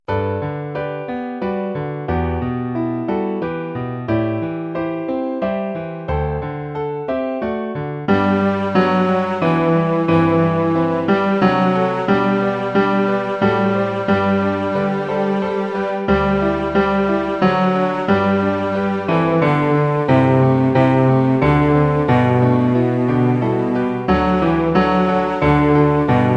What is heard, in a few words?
furusato bass